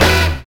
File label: STAB; E-Lab; X Static Goldmine 1